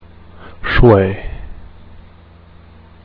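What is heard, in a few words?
Three Pieces for Clarinet; chinese culture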